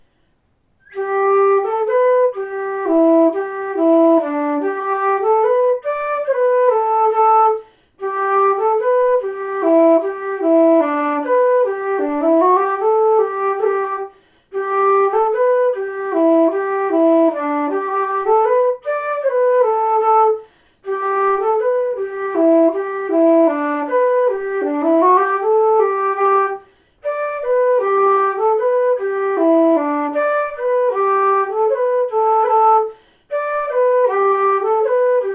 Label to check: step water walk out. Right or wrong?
Wrong